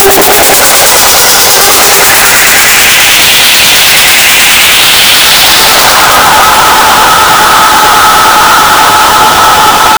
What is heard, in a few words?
hard trance fx